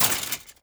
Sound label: step, footsteps, player, metal chainlink; Half Life Alyx